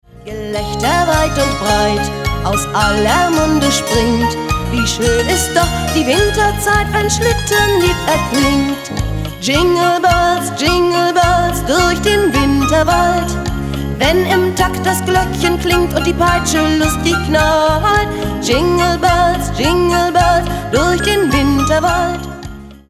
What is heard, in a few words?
Jingle Bells